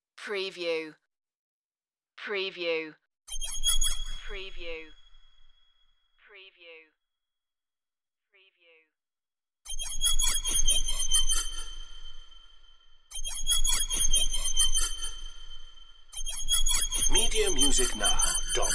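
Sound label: Creepy High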